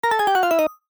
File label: Sad Ending